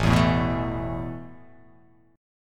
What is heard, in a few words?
A Suspended 2nd, guitar chord; Asus2 strummed